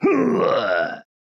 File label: man injured groan; soundscrate